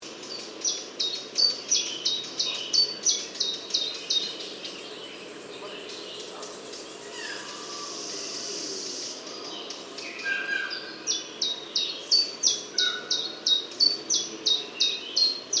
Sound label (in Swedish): Gransangare